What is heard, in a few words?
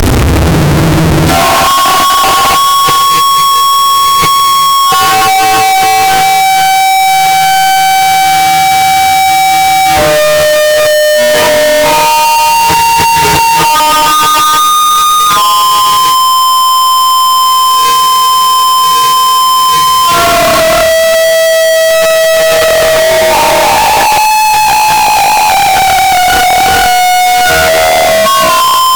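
Clarinet Distortion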